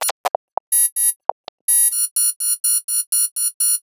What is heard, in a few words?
rattle spit F 125, synth loop; Zero-G; Soundclash; Nu Dance Electro